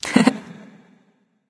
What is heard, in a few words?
surprise; Smite; athena